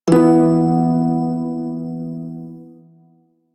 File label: Prime Shawzin Chromatic Chord H; Music; Warframe